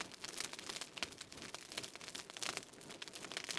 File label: torch; GrandFantasia